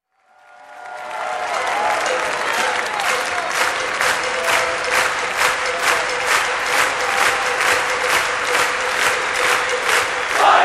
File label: cowbell